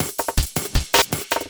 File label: LOOP SD, 160 BPM